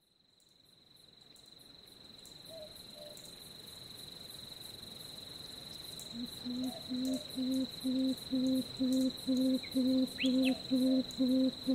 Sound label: Papuan frogmouth